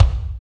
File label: KICK, Tekno Industrial; East West